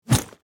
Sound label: Grenade throw launch whoosh; Military Equipment